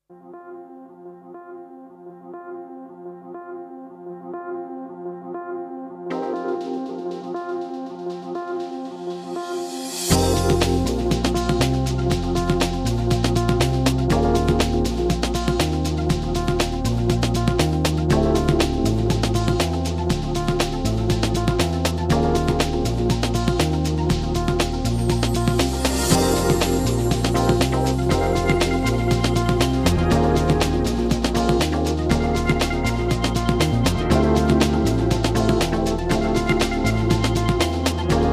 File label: Ambient Acoustic